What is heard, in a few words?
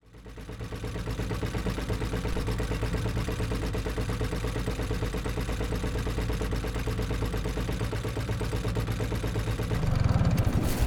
boat arriving at dock; jungle-journey